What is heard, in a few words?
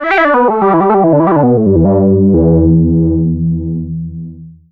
Synth Lead Outro; Trip-Hop; Loops; Sonic Foundry (Sony Creative Software); Rondo Brothers Trip-Hop Loops & Samples; Liberation